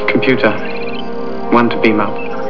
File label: beam up